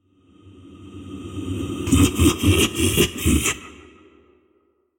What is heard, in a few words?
laugh